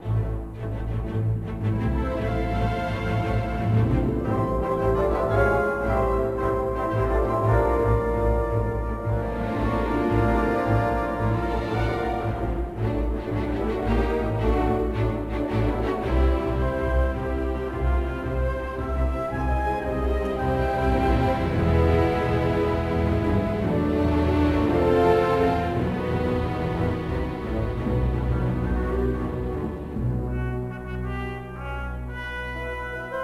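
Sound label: Music Ui Anivictory loop; Star Wars Episode III Revenge of the Sith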